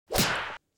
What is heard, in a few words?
Kung Fu Kick